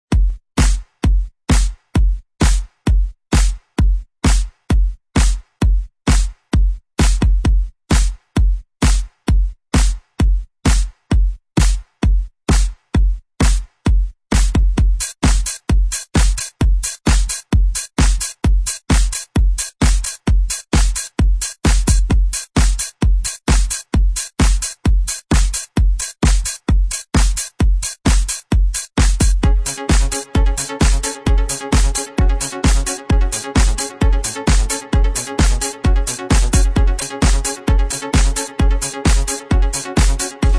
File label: dance music